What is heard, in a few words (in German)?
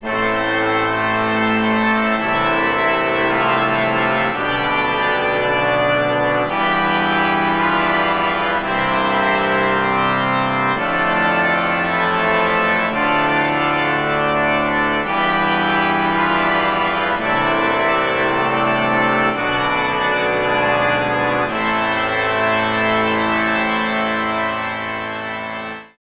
Stimmiger Contrapunkt; Ronge